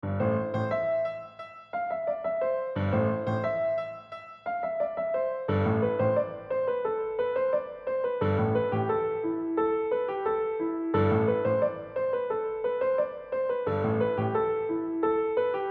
Piano Solo

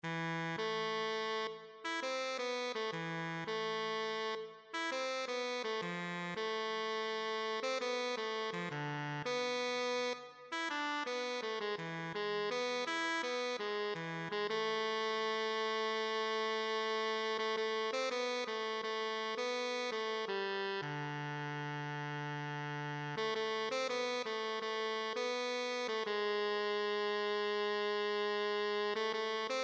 tenor saxophone

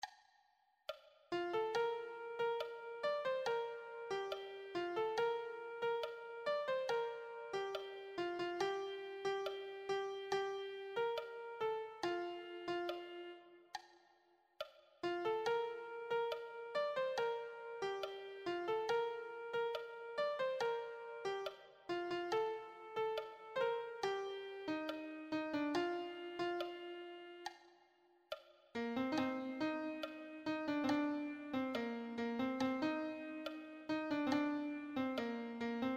melody

